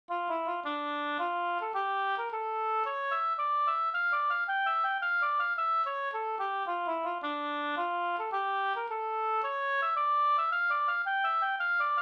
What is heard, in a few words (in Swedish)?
polska triolpolska